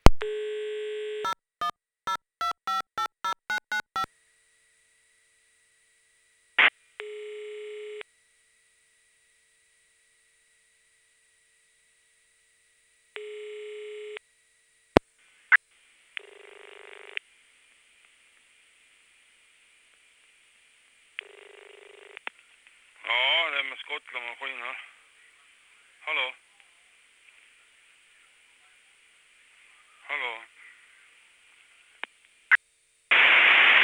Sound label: pots to nmt ship pbx